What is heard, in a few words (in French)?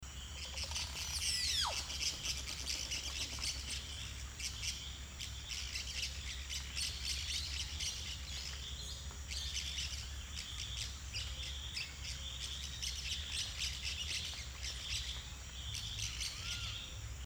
cassique hupe, Le chant du cassique hupé ressemblant étrangement à une sorte de synthèse électronique; Guyane